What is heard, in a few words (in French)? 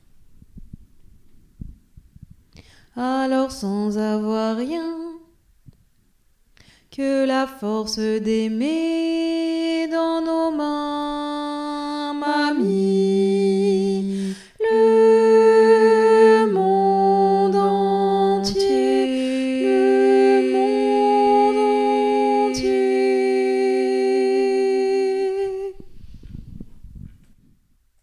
fin quand on a basse